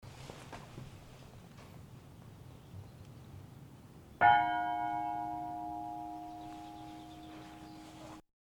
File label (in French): cloche; St Sauveur les Bray